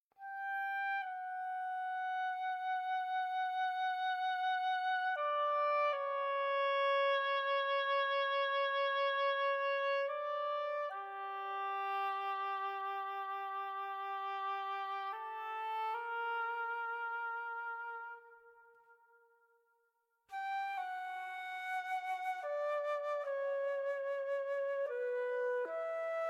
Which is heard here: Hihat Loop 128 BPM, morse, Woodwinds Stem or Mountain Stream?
Woodwinds Stem